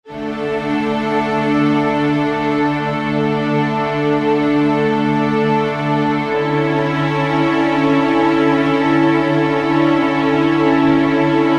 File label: Underscore PAD; Worship Flow